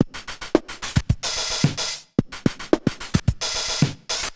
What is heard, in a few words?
Bent Korg MR-16 (110BPM), cyborg beats; musicradar